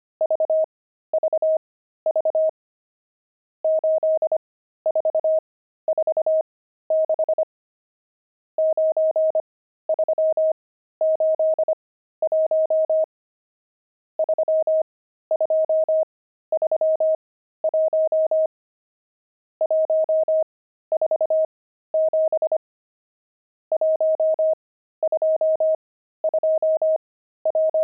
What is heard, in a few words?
pangram, intermediate pangrams files